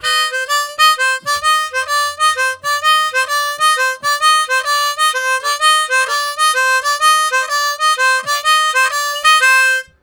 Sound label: Patterns, Harmonica, Riffs; Zero G